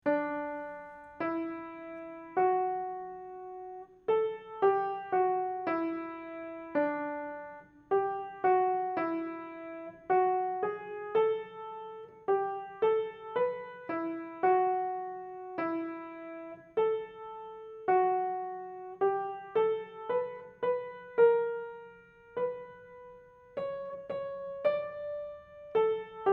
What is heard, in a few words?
Hymn Melody; Once Again My Heart Rejoices